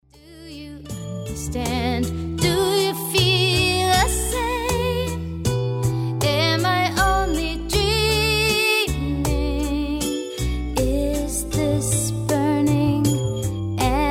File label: Bangles